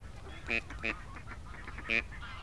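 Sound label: gadwall mareca strepera; seraya utka; utok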